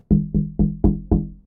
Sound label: window, doorKnocking